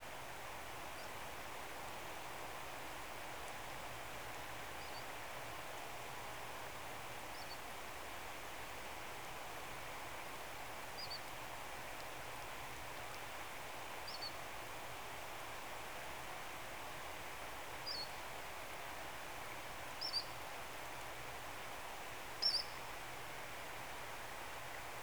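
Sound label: squeaky nocturnal flight call; NFC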